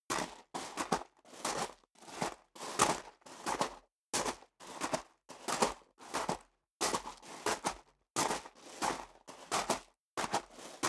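step sneaker gravel medium walk sequence, footsteps; schritte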